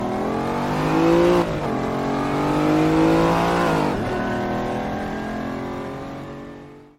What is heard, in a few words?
countach